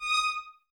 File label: Violins Detache D#6; Miroslav Vitous; 11 Violins